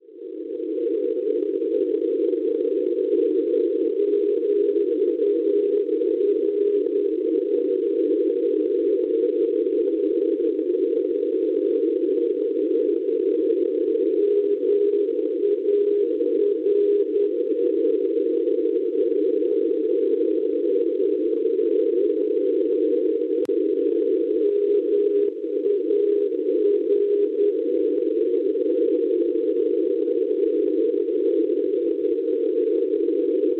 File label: NDB, 383 kHz; MAR; Marsiglia; Francia; Schwarzsee